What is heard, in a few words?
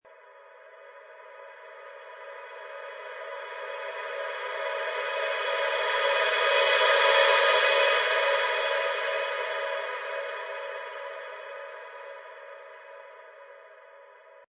Sci-fi, slowly passing spacecraft or ectoplasm; Alien Being Bypass; Lynne Music